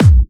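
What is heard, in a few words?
BD Clubby, bass drum; VEC1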